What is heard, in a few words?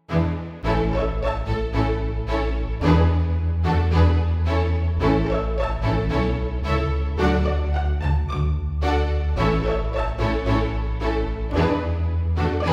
Auld Lang Syne Light Drama 110